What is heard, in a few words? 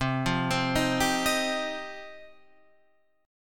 F 7th Suspended 2nd, chord